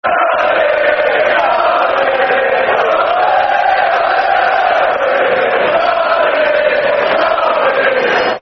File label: Ole, Ole, Ole, Nade, Football Chant, Soccer Song; Sheffield Utd Sufc